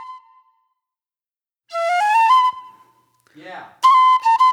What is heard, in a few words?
Eastern Flute